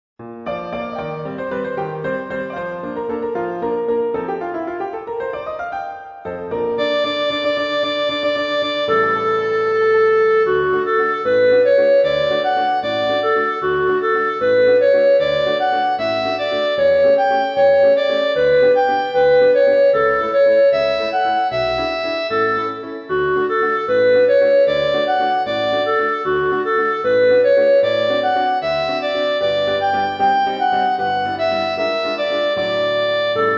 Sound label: Thank You Gallant Gondolieri Chorus Cue Soprano; gondoliers; Tra la la